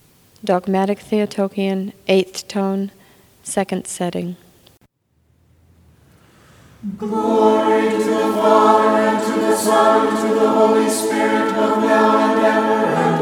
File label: Dogmatic Theotokion Tone 2; Setting 2